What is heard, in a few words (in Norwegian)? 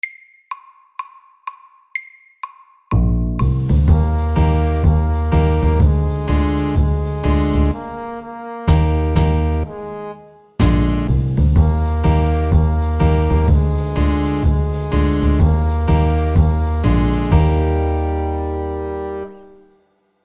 Godteri FAST 125